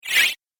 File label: Game App Flyby, Video Game Sound Effects